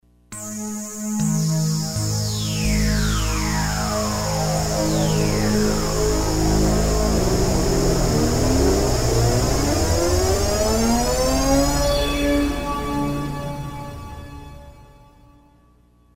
Sweep With Me; Kris Rhen Presets

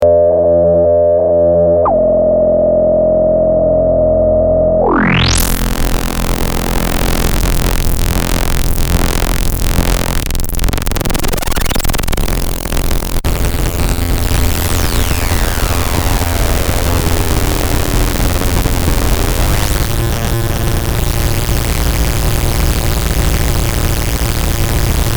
Osc FM Filter FM Tweak

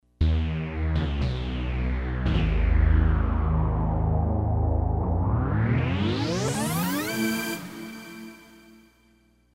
Mondo Sweep; Kris Rhen Presets

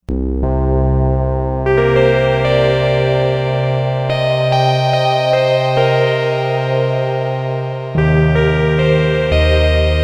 BELLS Bellz By FM Mod

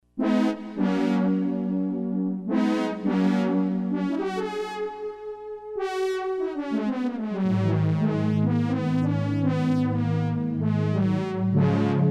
Fanfares; Kris Rhen Presets

